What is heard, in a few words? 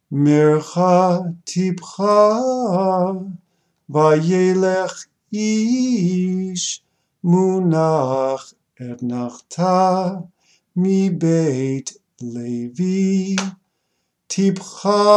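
Chanting Practice Verse 1, torah trope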